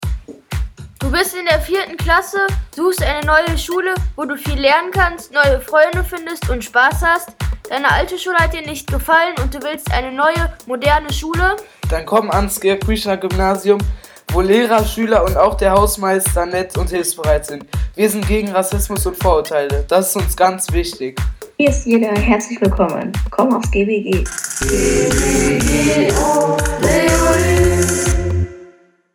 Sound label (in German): Musik; GBG Werbespots